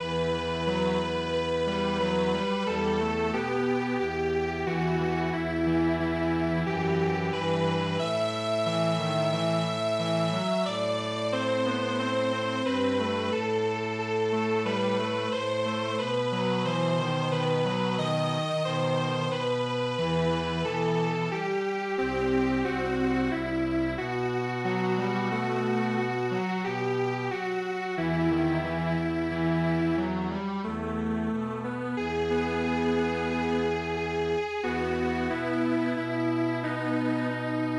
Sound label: Strings Quartet, traditional